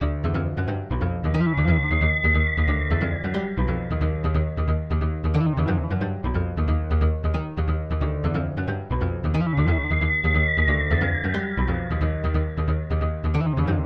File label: Weird Cartoon Groove